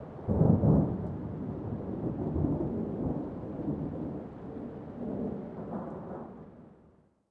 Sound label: thunder light; Rust